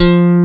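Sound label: F2 Telecaster, guitar; AKAI S-Series CD-ROM Sound Library VOL-8; SET#1 GUITAR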